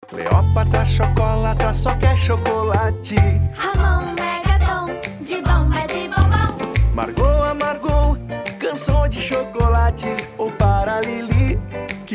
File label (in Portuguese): Jingle Com Assinatura; pascoa 2019